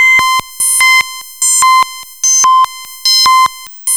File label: FM BEAT, FM LOOPS; Zero-G Ambient 1